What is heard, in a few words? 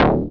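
FX synth; Sound & Vision - Gigapack II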